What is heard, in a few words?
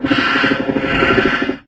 search, antlion guard; hl2